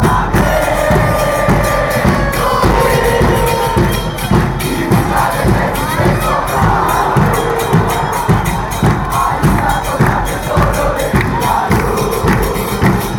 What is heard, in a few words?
santa fe chant